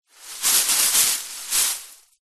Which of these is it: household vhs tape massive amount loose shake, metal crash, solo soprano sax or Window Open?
household vhs tape massive amount loose shake